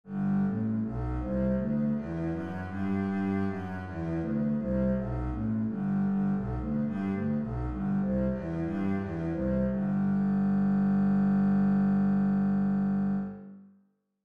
bass G major

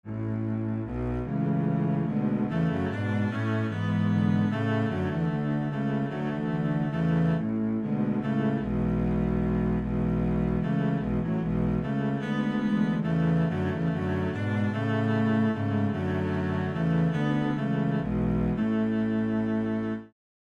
minuet, string bass

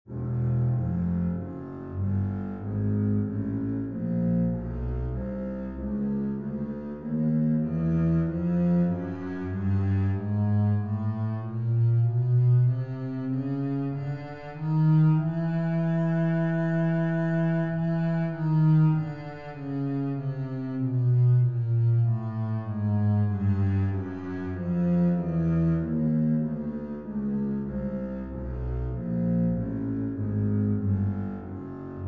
bass chromatic scale

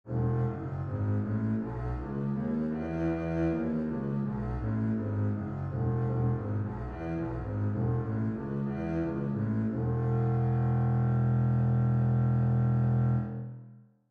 bass E major

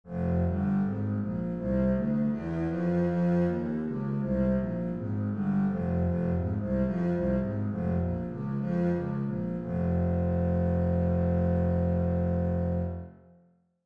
bass f minor, scales

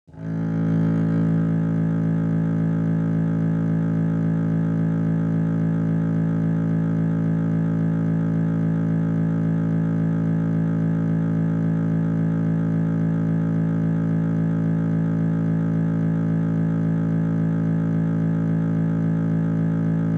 bass A

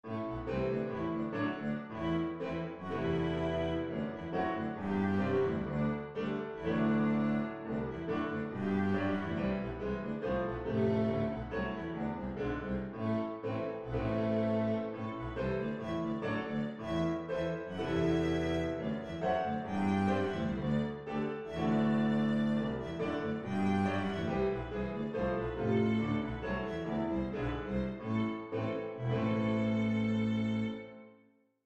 braul, string bass

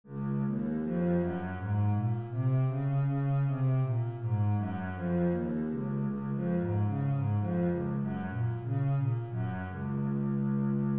bass D flat major

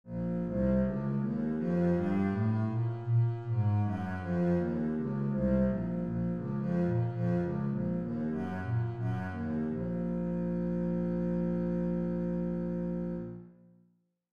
bass B flat minor

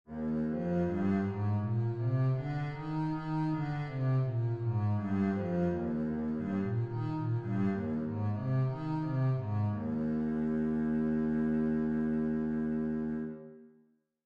bass e flat major, scales

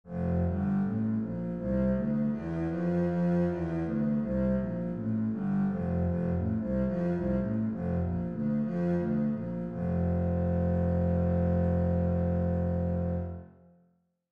bass f major, scales

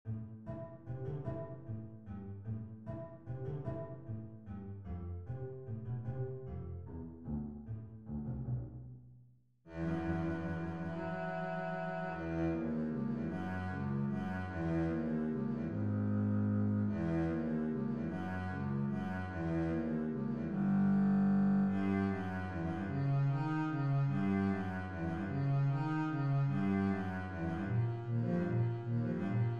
assez vif bass, string bass

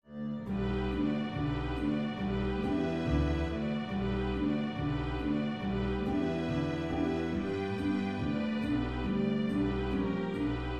ragtime; ragtime full

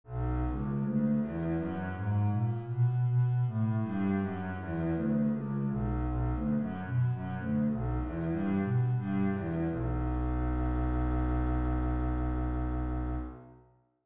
bass b minor, scales

